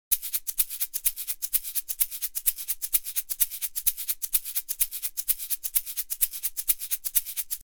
Shaker

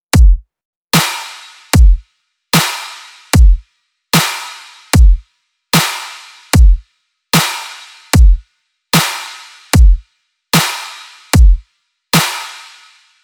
Kick n Snare

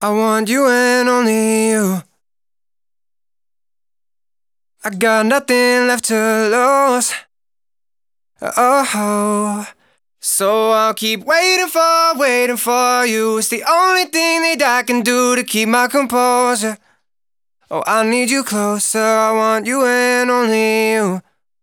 vocal long phrase dry, 100, A

